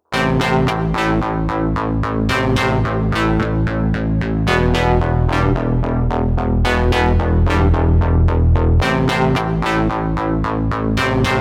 Bass Man; TCL; 20 5G; ringtones

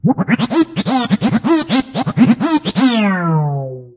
Thriller Three Noises; ringtones; ZTE Max XL N9560

